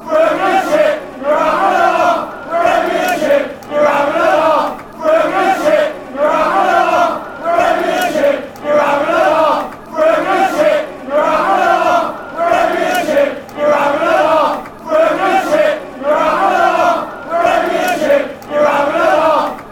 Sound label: your having a laugh, fanchants; premiership